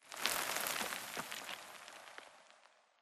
Rock Debris Small Short; Boomtown; Titanfall 2

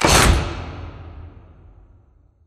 sliding door lg verb open start; beacon; Titanfall 2

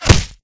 bullet light ballistic flesh; Titanfall 2

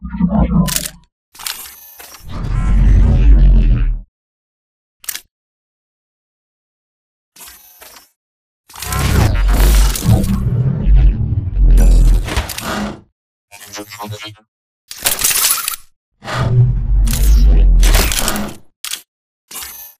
Casual Idle; Titanfall 2

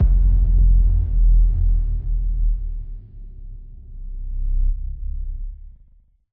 Giant Floor Panel Lower Rotate LFE; Boomtown; Sideways Town; Titanfall 2

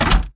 Mvmt FS Walk Default; AI Stalker; Titanfall 2

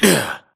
grunt hits; Titanfall 2

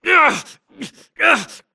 grunt Struggle; Titanfall 2

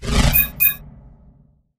Kit Replenished UI Flash; Titanfall 2

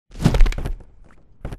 flyer land close; boneyard; Titanfall 2

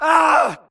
grunt death quick; Titanfall 2

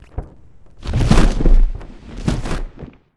flyer perched take off attacked close; boneyard; Titanfall 2

